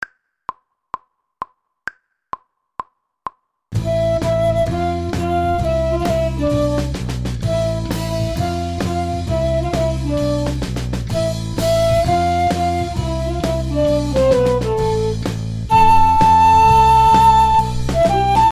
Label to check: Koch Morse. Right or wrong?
Wrong